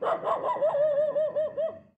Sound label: dog; Mount&Blade Warband